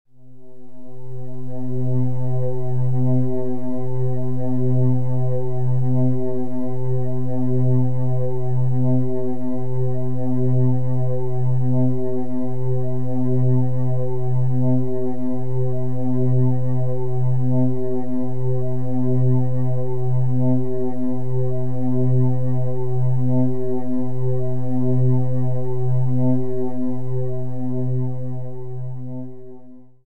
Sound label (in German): herzton